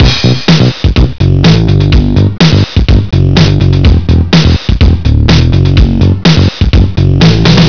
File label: rythm loop